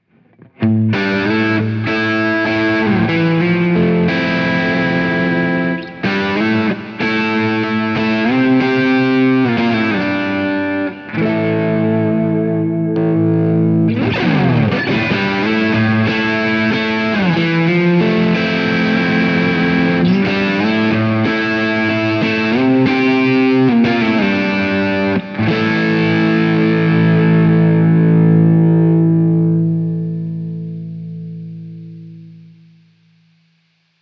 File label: neck pickup